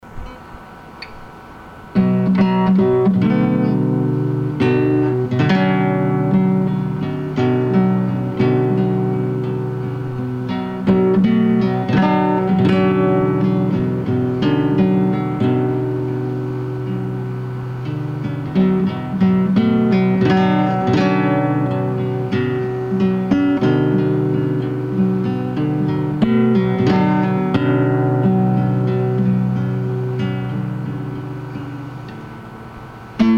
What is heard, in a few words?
Guitar